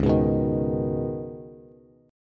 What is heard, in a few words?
Db 7th Suspended 2nd, Bass, Db7sus2 chord; JGuitar